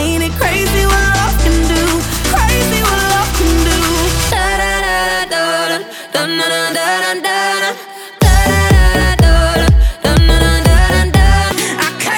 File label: Dance Pop; wedding breakfast song